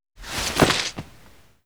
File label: rappel jump up, foley